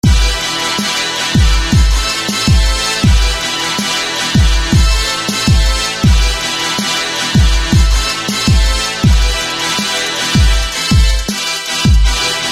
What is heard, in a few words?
Brass; Brass Majors; SFB FX